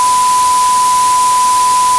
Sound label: 1k sin dithered tri